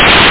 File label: stick